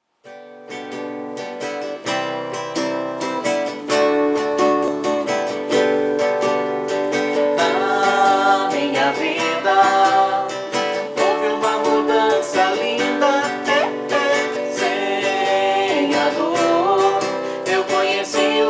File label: vocal